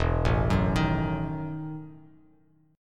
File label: E Major 7th Suspended 2nd Suspended 4th down Bass Standard, chord; EM7sus2sus4 arpeggiated